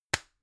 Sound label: gum plastic box close hard